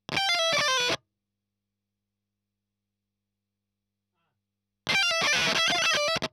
EL Fuzz End

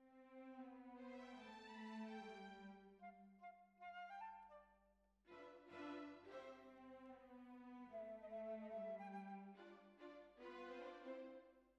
Bassoon, Jazz for piano

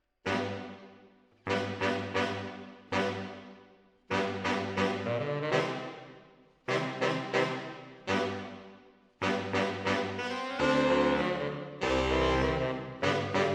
MKH AB, piano

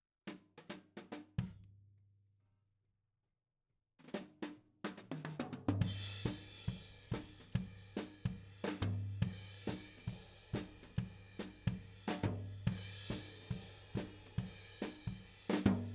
Tom

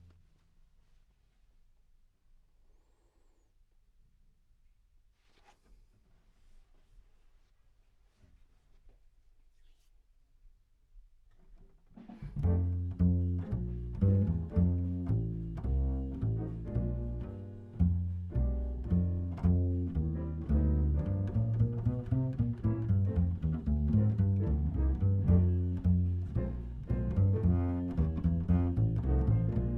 Bass

